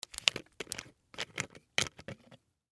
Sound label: Drink Bottle Twist, Drink Bottle Twist Lid Off; Twists